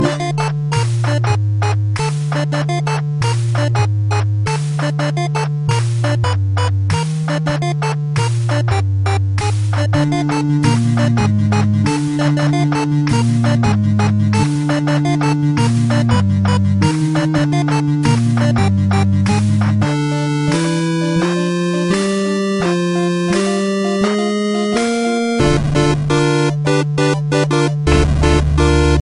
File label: 8 bit; doctor who